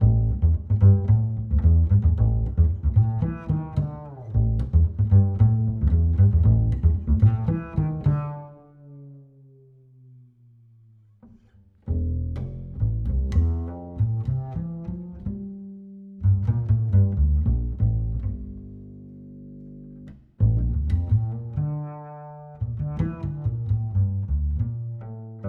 Ac Bass Plucked Low; 25cm C

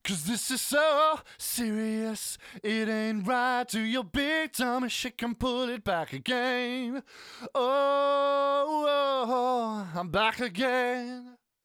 Vox Tonal Para Comp In

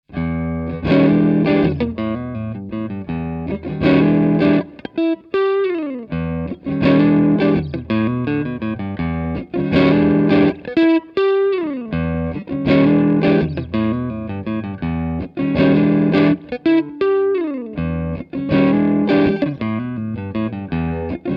Elec Gtr Location Medium Hall

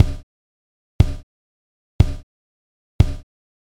Kick Comp

